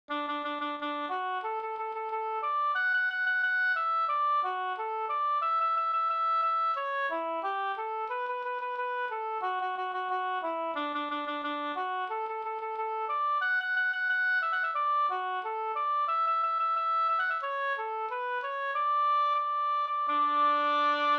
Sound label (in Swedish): ganglat; fr Tunaberg e Rickard Forsman